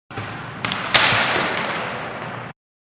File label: Ice Hockey Slap shot more distant; Hockey (Sound Effects:Sports); Hockey Ice-Slap Shot-More Distant; Manhattan Production Music